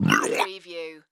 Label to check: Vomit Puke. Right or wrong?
Right